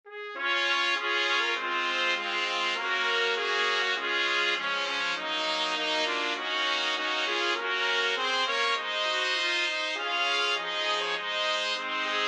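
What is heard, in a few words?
national anthem russia, 4 trumpets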